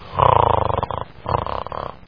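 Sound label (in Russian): храп длинный вдох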